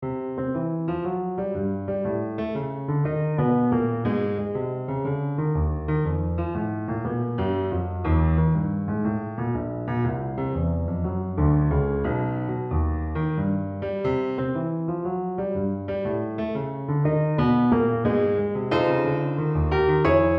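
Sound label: 70 Tone Boogie Playback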